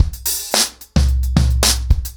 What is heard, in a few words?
110BPM, acoustic drums, drumloops